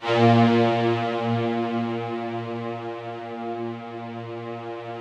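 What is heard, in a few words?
rough strings A#1 left, Yamaha VP-1; Music Store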